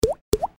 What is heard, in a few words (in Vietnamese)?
Tieng giot nuoc